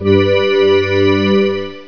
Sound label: Dominate, Beeps; Whole Bunch o' Beeps